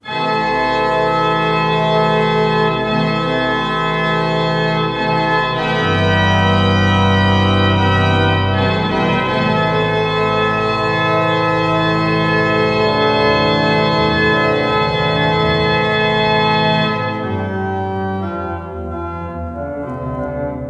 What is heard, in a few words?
tutti